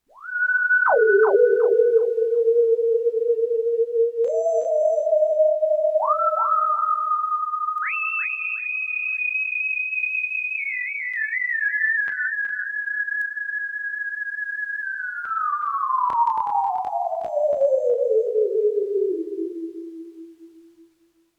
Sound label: end whoo